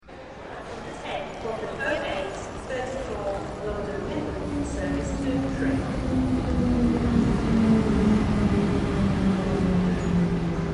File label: train